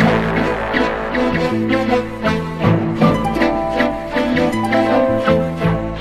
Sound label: horns, Loops